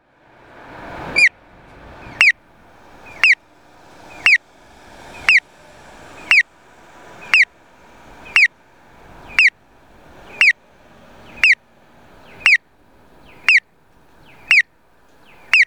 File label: piyo, onkyo kosaten; aichi; moriyama; hyotanyama; nagoya U-TP-1